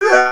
Male Hurt Robot; Mars Sortie

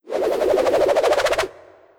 Abyssalflame Whirl; Legend of Keepers (gamerip) (2019)

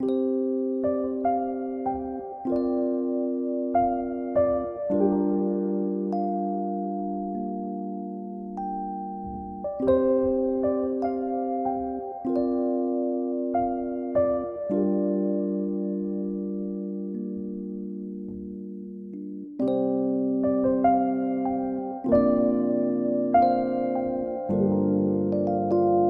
Keys - 98bpm

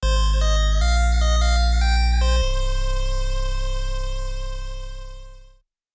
stinger; Street Cleaner The Video Game

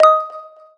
Music Frontend

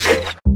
Spider Attack Sound; enemies

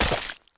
Obstacle Icecream; In Obstacle Icecream

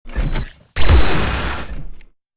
Purification

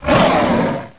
Wolf Attack

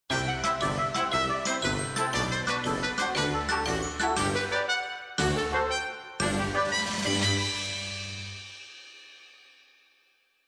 jingle - Win

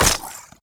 Got Hit; Mars Sortie

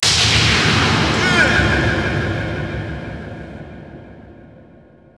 Str G#

